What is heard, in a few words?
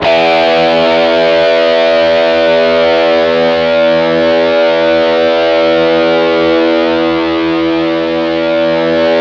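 Gibson SG E2, guitar; AKAI S-Series CD-ROM Sound Library VOL-8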